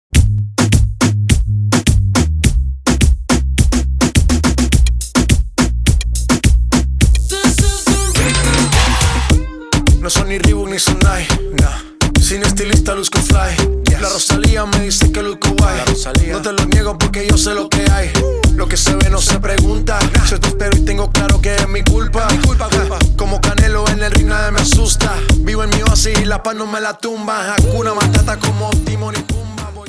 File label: REGGATON INTRO BPM 105